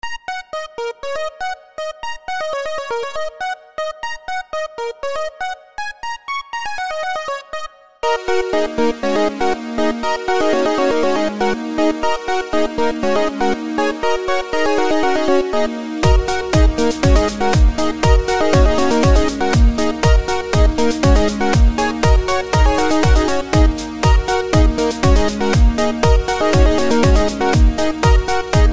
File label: Chiptune Test